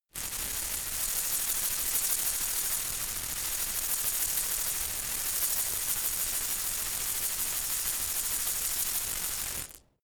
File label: insect shaker rattle soft